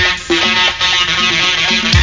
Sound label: bass break